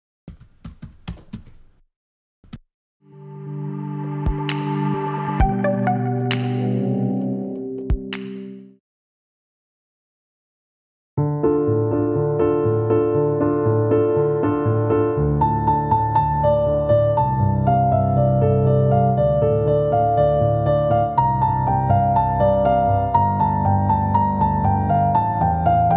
караоке piano; бенди и чернильная машина

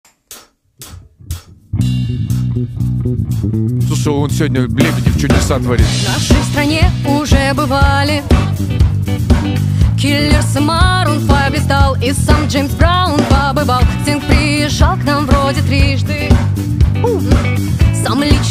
Georgian disco на русском

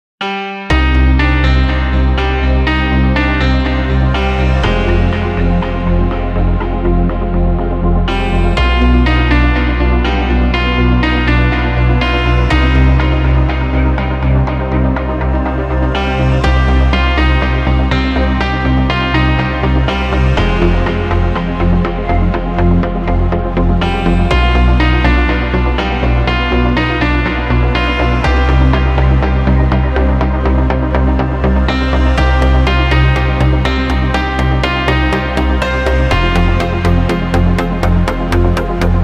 спокойная музыка

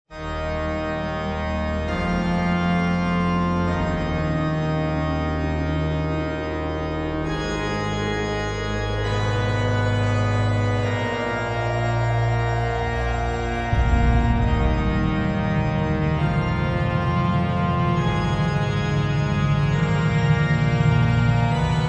эпичный metal; крокодил гена; ai cover

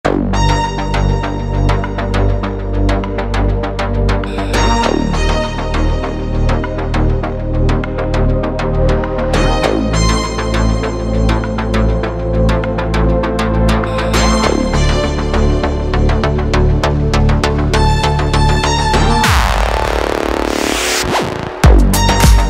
электро deep лаунж с глубокими басами

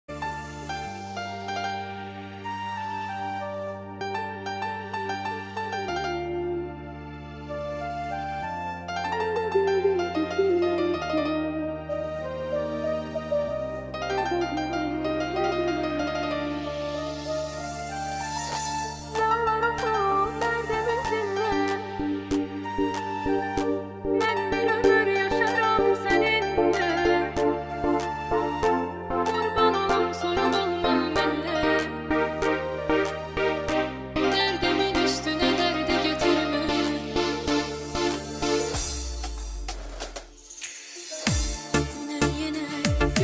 красивая турецкая песня turkish music

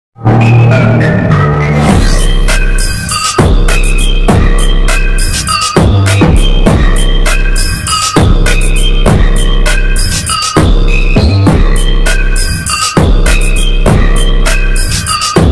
мелодия на телефон

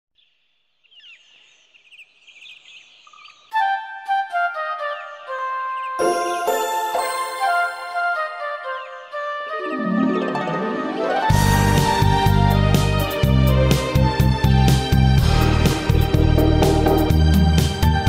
минус instrumental

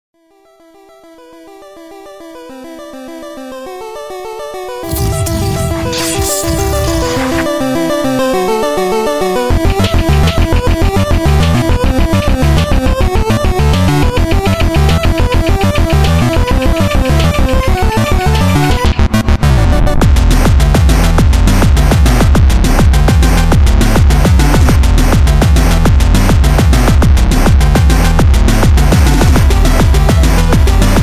музыка колонки; из chicken gun

